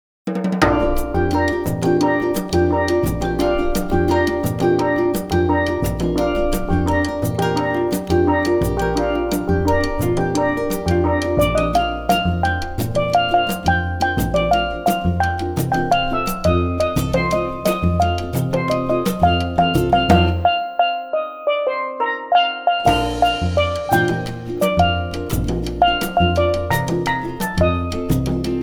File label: Caribbean Band; three rock and roll castaways